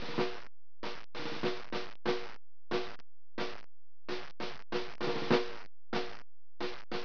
DRUM; 10,000 Graphics Pack Volume 1; Media Graphics International

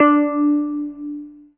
tone

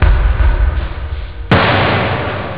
land, drums misc; OctaMED SoundStudio V1

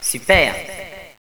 kit; Villagina; Village; ECOLE

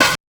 SNARE, Drum Bass, 160; eJay

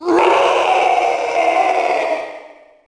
new death; Thief II

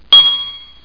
ding; Monster Media Number 15; os2

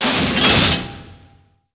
CLOSE

